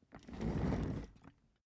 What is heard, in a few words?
office chair trundle slow